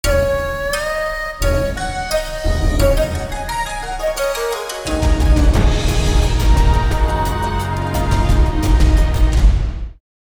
大气的背景音乐

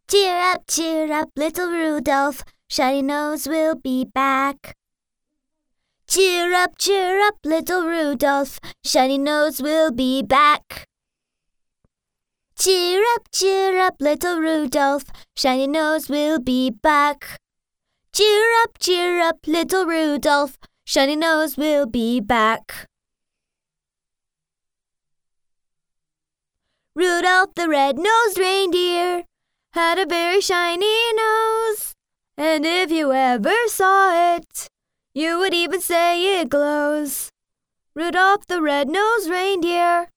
外籍女英 外语 美式英语 儿歌

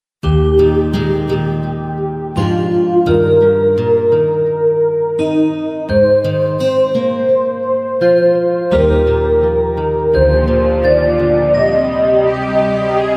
抒情的背景音乐; 30秒以内

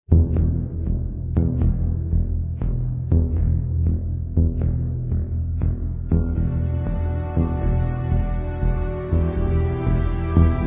专题片背景音乐

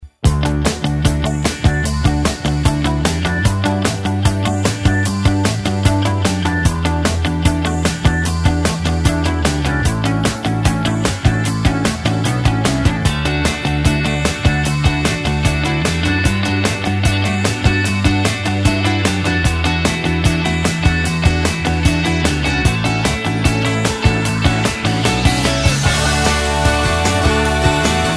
专题片背景音乐

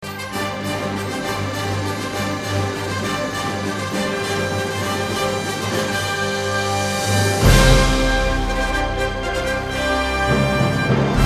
片头音乐

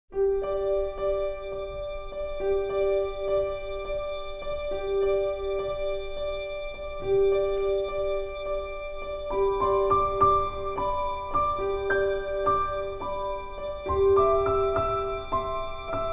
电影音乐; 奥斯卡电影音乐

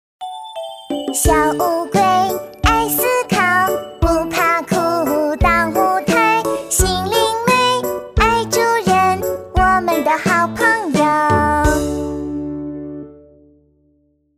歌曲翻唱 女童风格：甜美可爱

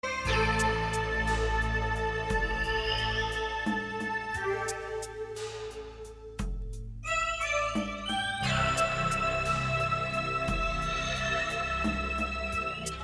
电视包装音乐

